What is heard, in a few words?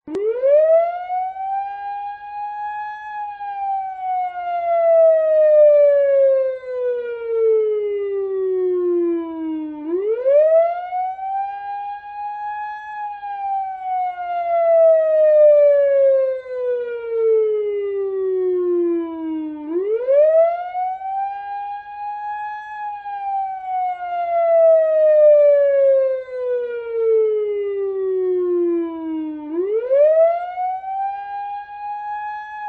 police siren